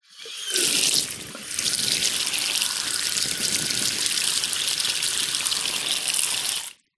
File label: household bathroom tap run basin short off close up; zapsplat